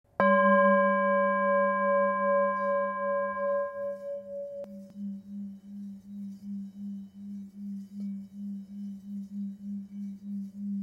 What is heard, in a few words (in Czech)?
tibetska misa; Tepaná tibetská mísa Chuto o hmotnosti 1 767 g, včetně paličky s kůží; Chuto velká